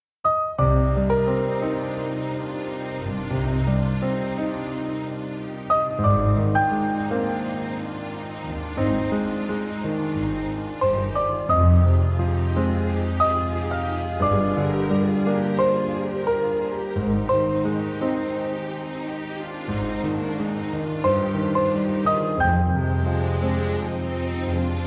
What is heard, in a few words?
Piano version; Astrea's Children; Swords of Gargantua